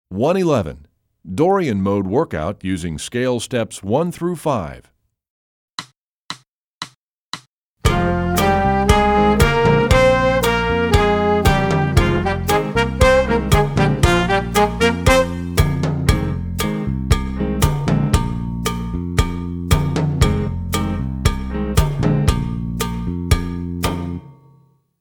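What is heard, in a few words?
dorian mode workout scale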